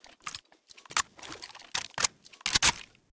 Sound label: svu reload; STALKER